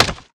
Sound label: axe mining; factorio; hairyclubnub